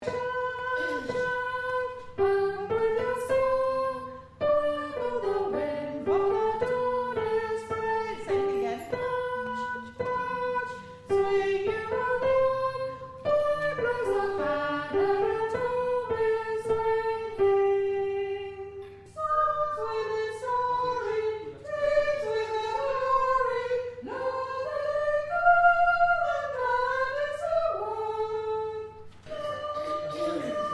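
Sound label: soprano low; March Women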